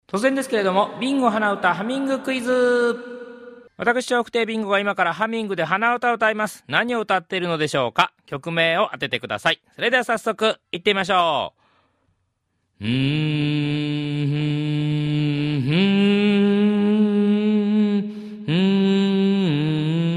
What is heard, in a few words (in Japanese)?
hanauta